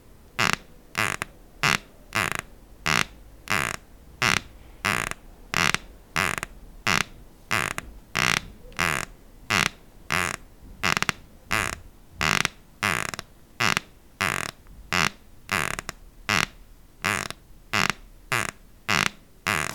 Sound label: Squeaking Wood Floor